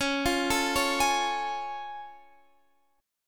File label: A Augmented Major 7th Db